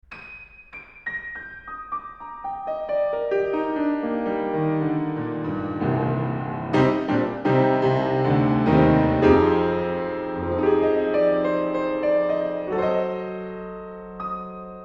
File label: HF Beam Piano Rear Open